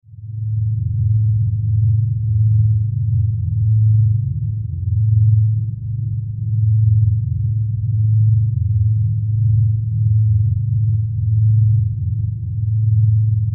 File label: Energy Source Pulsin, spaceship fx